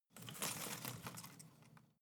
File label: home plant leaves rustle soft